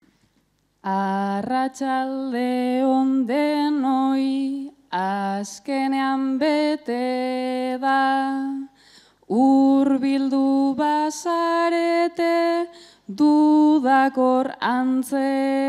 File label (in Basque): agurra, bertsoa; bilbo